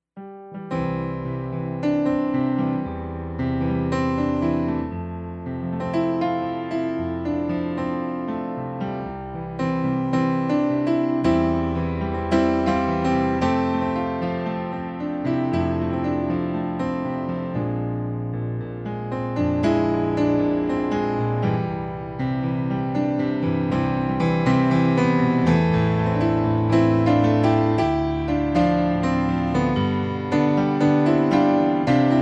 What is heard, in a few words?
Theme solo